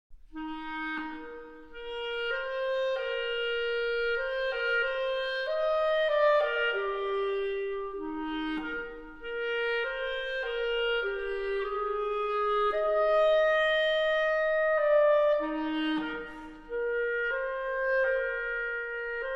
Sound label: Thriving Flute Solo